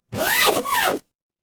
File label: paper fabric zipper